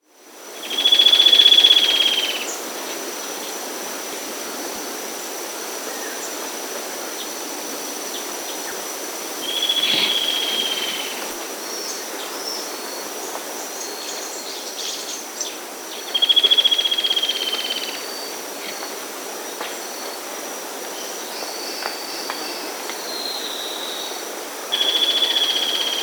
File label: Yellow-Breasted Antwren (Herpsilochmus Axillariis), Andean Birds; S. America